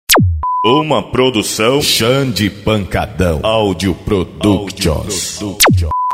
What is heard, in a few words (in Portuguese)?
vinheta pancadao